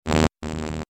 future buzzing elements layer

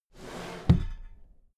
drawer cabinet equipment close regular halfway

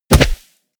body fall snow powder bounce

